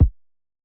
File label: Hiphop kick; Reverb+EQ+Comp+Limit